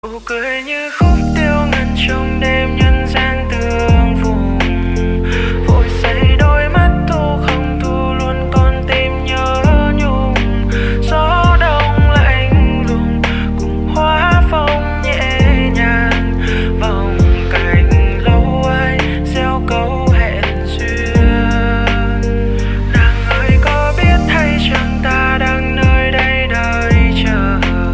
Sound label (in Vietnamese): Nhac Chuong Lofi